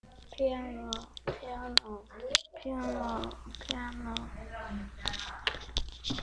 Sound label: piano